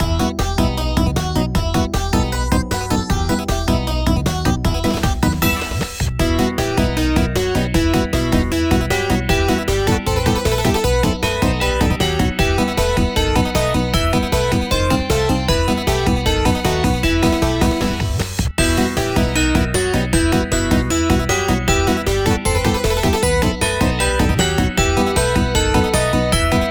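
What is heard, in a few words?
background music map; ovenbreak 3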